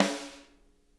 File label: snare rim, drum kits